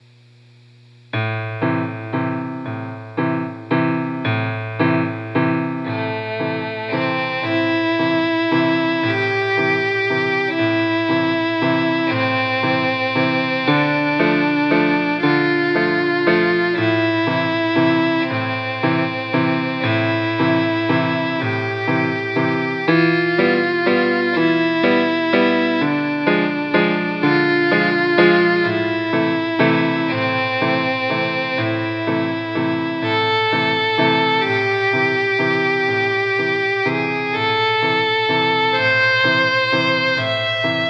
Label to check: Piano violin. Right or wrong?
Right